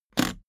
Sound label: suitcase latch lock jump open hard